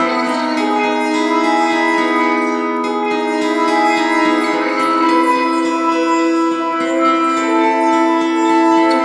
acoustic guitar wet, with airspace, hybrid reverb and delay; modeaudio